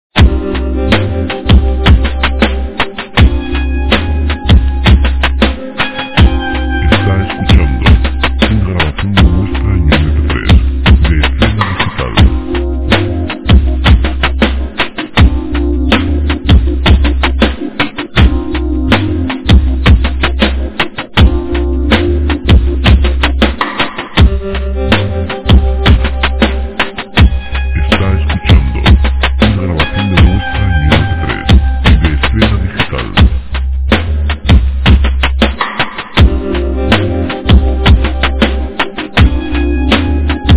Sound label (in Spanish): romantica lent